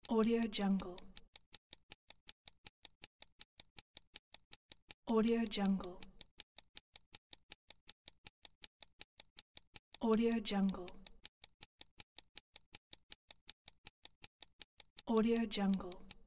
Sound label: the clock ticking